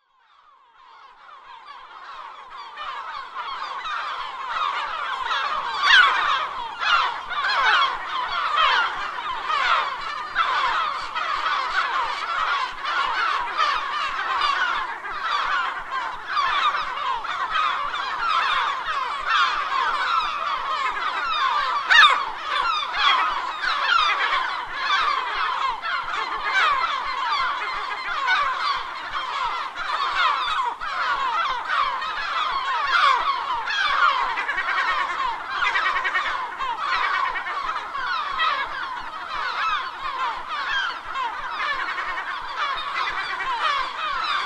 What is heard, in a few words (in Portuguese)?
Cantos Gaivota; aves marinhas